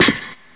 grate; player; blackops

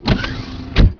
door; duke

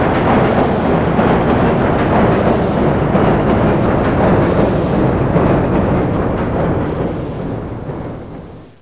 train stop; ambience; poke646

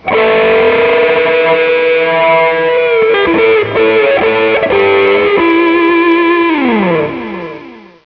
guitar

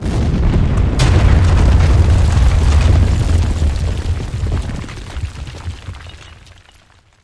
final explosion; vendetta

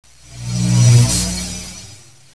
katana charge; weapons; ayakashi

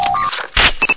plasma

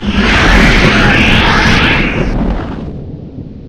warp out; st mission; hs c4

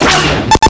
primer; vendetta

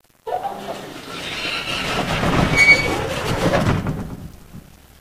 door slide

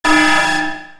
alarm; vendetta

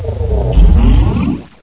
panther idle; custom monsters

